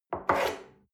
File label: espresso grab from table slide medium, restaurant cafe